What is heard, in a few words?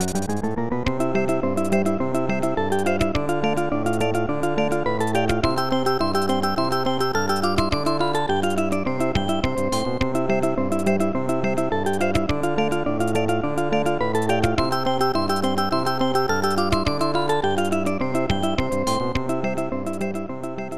Magical Powerup; Doraemon; SNES